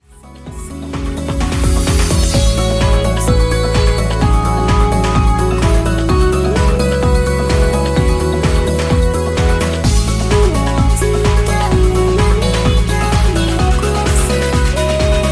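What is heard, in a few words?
background music crossfade; Invaxion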